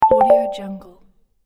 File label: twinkly percussive video game resume sound; tony-parsons-music